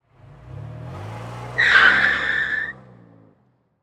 Car Loud Breaks; Cars

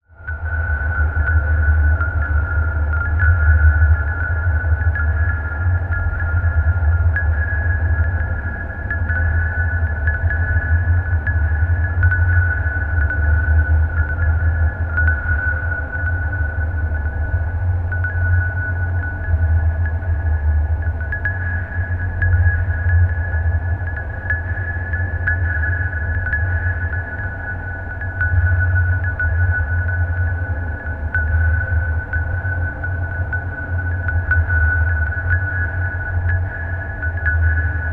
Digital Ambience; Cinematic

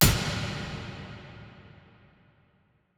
Impact Metal Falling Fast Echo; Impacts Cinematic

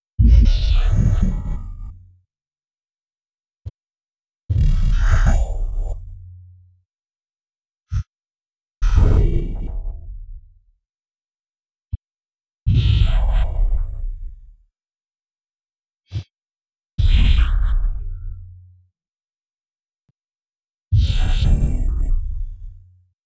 Ultra High Splash, Electro

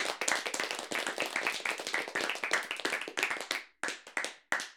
Ten Persons, Clapping

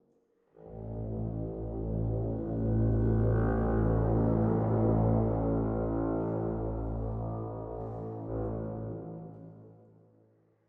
Dramatic Brass

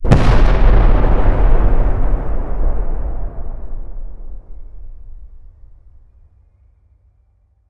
Tension Impact

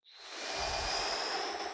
Human Swoosh Long; Human Whooshes

